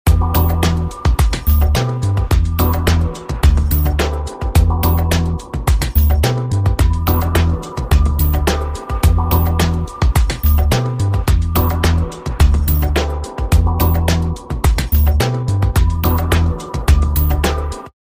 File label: Top Instrumental Ringtone